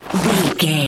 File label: footstep gravel slide